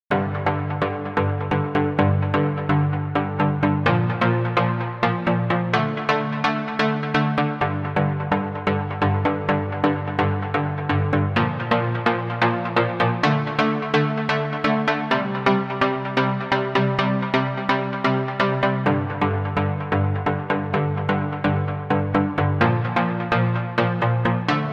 Synth Arpeggio active; museq

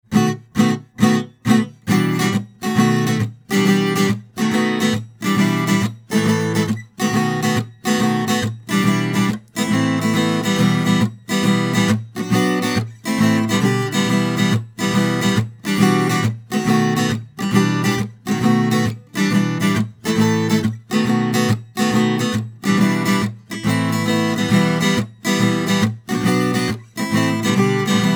Acoustic Git bypassed; skulpter

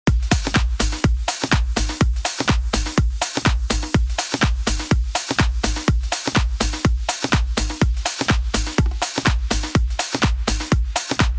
Mix Buss Techno Drums active

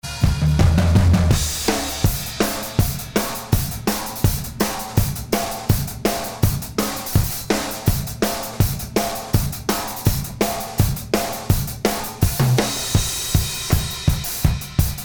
Drums Plus karacterised Mono Room active

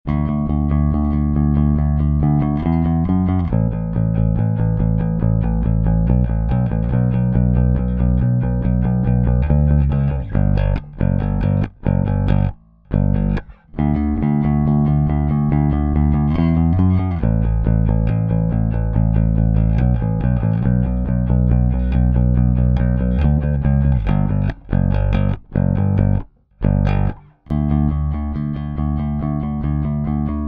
Bass bypassed; skulpter

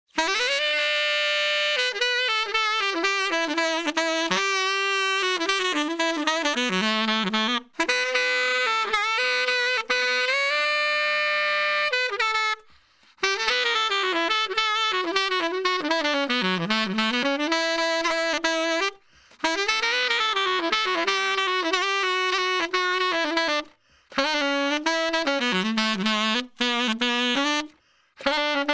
Sax Solo active

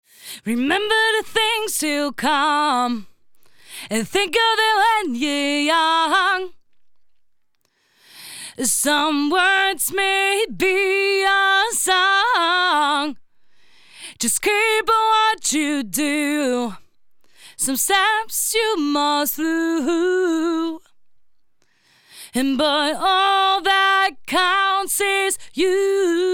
Lead Vocals active; skulpter

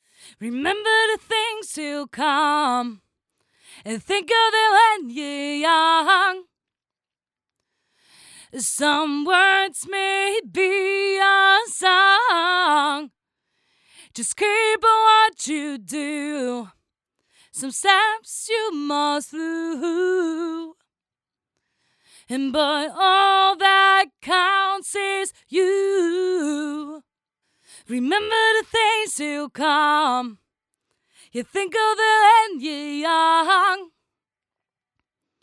Lead Vocals bypassed; skulpter

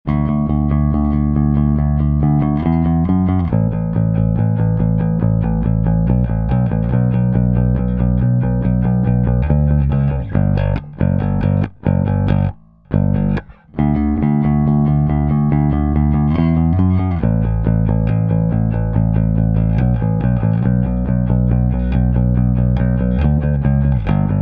Bass active; skulpter

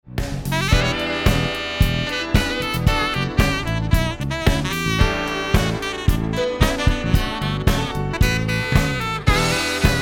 Sax Solo in Mix bypassed

